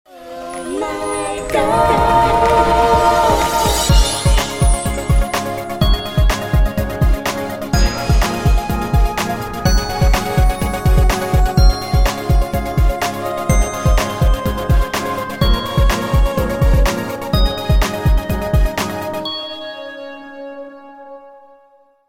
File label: jingle; Ohmydollz